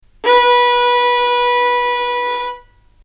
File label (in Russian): skripka dlinnij zvonkij